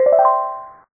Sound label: Box Complete